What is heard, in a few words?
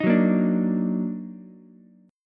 D sharp Minor Major 7th, Ukulele; strummed